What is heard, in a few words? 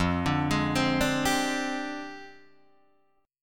F Minor 6th Add 9th Guitar DADGAD; 3,5,6,5,5,5; down; chord shape